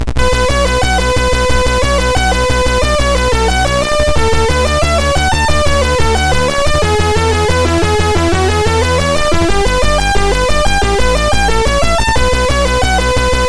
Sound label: Music Expansion, MOS6581R2; Music Expansion Demo BASIC